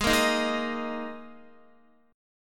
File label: G sharp Suspended 2nd, G sharp, down, Guitar; G#sus2; strummed; JGuitar